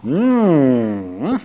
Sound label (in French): hum délicieux